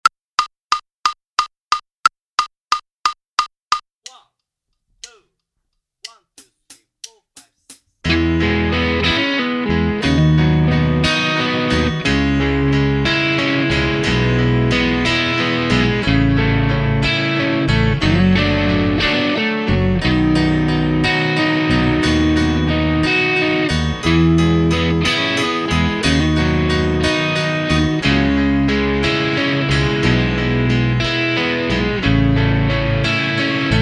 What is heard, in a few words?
Minus Drums; Inst Ensemble